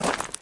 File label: hit floor; grim fall